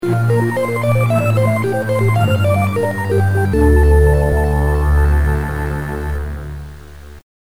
item acquisition; metroid fusion

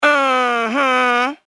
mmmmhmmm

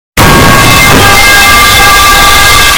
pipe; dank

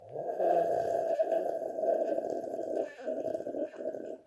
slurp

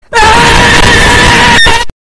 jumpscare sound; the last night at peach

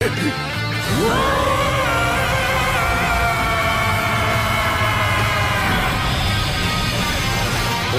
roar; goku ultra instict

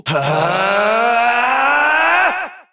ki charge; yamcha

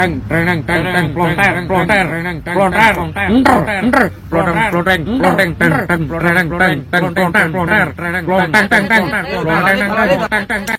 motor

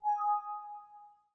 windows 7 exclamation sound

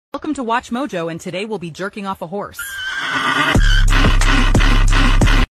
horse; watchmojo

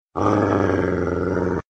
grr, grunido

